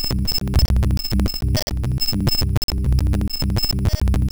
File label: buffer fuct loops